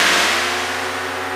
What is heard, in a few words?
Shrinking Membrane Filt; Dynamic DWM